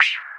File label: Percussive FX; Vengeance Samples; VEH1 Percussion